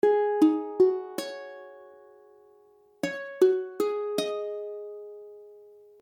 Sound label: Ab7sus4, akordy ukulele